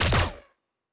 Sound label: Weapon Shoot